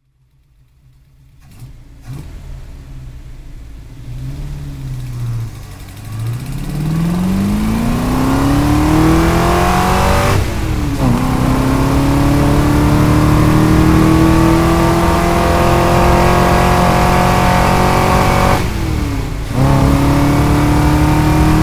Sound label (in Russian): Zvuk motora Chevrolette Camaro